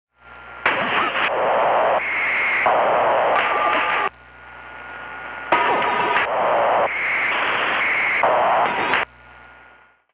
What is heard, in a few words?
Radio Horror, Short Wave; Sonic Foundry; The Electronic Music Manuscript Collection; EVP Short Wave Recordings